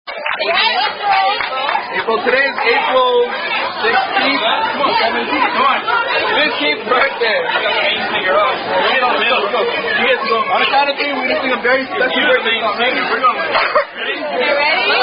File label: happy happy birthday; Chili's